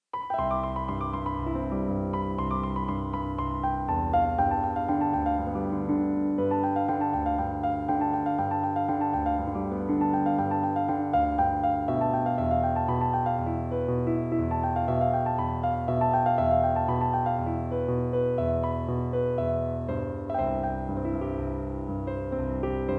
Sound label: Piano; Virtual Music Composer 4